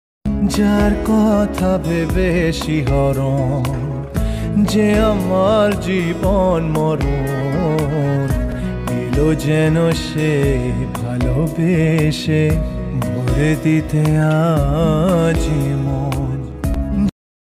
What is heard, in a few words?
bangla song